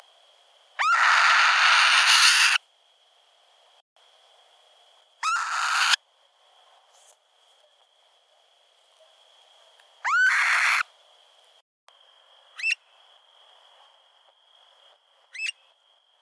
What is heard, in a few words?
call, burhinus oedicnemus; Sennheiser M67 and Sony MiniDisc MZ-R30; Dimitri Giunchi and Chiara Caccamo (Departement of Biology, Pisa University)